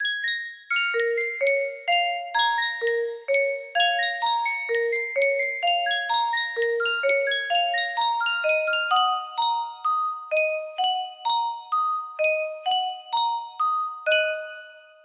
Yunsheng music box, music box melody

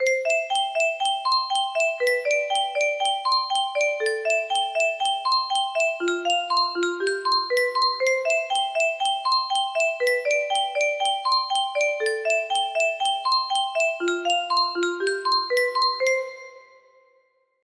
musicbox

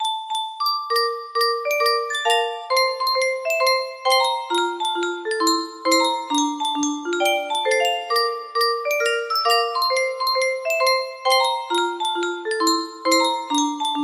melody

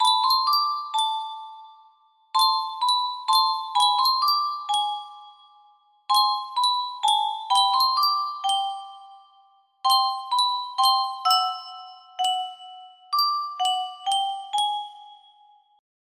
sankyo custom tune music box, music box melody

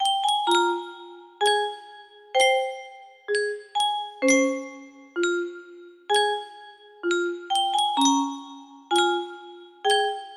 Yunsheng Music Box, music box melody

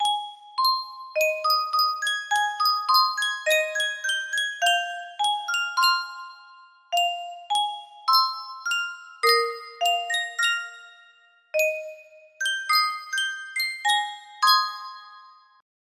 sankyo music box, melody

